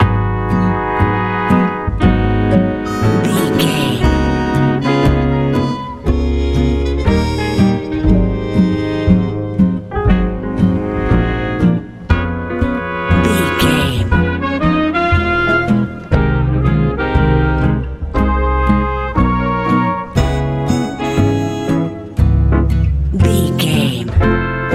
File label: colorful warm rice grains life jazz